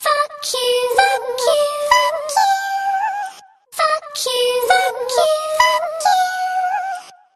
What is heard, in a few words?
fuck you fuck you fuck you sung; Voice; expletives